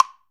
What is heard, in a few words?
WOOD BLOCK; ILIO; Synclavier Percussion 2; World & Orchestral